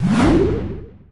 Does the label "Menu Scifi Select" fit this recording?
Yes